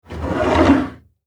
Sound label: Drawer Open